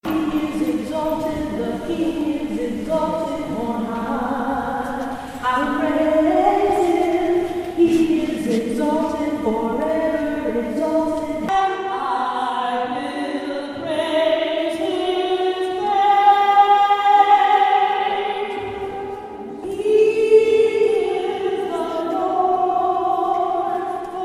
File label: ALTO